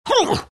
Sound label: riki pain